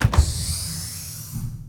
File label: Scifi, Door, Space Door, Vacuum; Variations